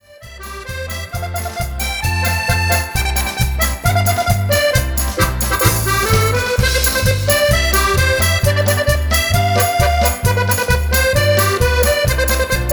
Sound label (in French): marche